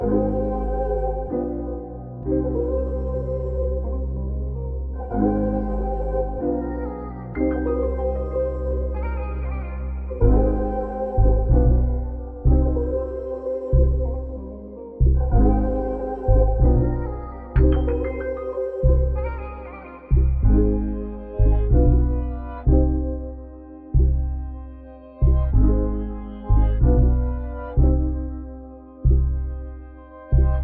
94 BPM C Min, Hip Hop Melody Loops; Optical

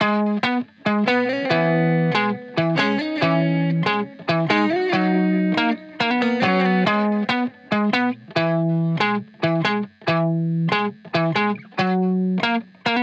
140 BPM G# Min, Guitar; Reminders; Cymatics

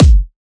Riddim Kick; One Shots; Ghosthack; Riddim Essentials 2020

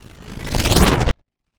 wooshes transform sweetener

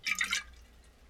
Liquid Sloshing In Bottle; Fairlight Sound Lib

